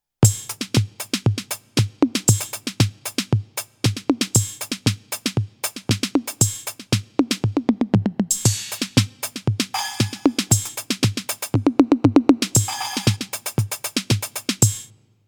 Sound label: Mesh Kit Electro Kit; ALESIS Nitro